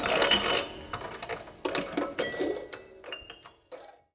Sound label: Prepared Piano; Battery 3 Sample Kits